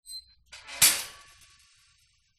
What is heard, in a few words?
shopping cart metal flap close; foley; zapsplat